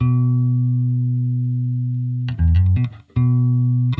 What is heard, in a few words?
bass; musdb18